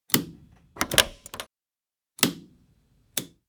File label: Helicopter inside interior pushing buttons